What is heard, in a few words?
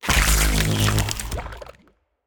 trail; smite; god cthulhu